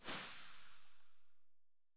sa58 silencer very far, weapons; STALKER; IWP

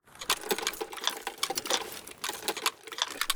sprint pkm; STALKER; IWP

